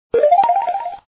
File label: SFX Coin; meco kong windows gamerip 2013